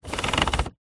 car temperature dial turn clicks toyota rav4; vehicles

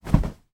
foley blanket shake; sound effects one

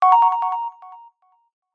app alert tone

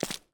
fantasy Footstep Dirt; little robot sound factory

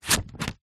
office scissors cutting paper single cut

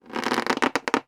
foley balloon rubbing squeak; sound effects one

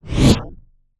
Swell Flanged; bottlerocket

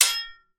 swords x 2 hit

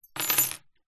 door keys small bunch plastic fob set down table; zapsplat; foley

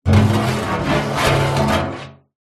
metal rusty pole scrape on metal oil drum; foley

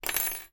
household large pin drop on table

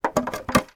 toy plastic doll house door open

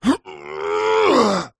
human male voice grunt groan lift heavy weight

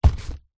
foley cardboard drop lightly

